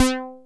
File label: TEISCO, BASSES